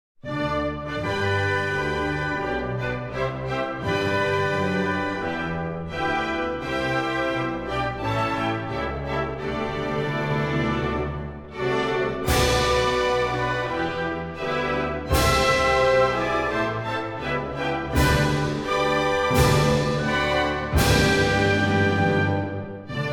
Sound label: Uganda National Anthem Long Version